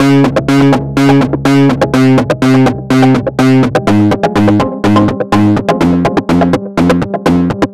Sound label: Synth Sequence; Eclectic Electronica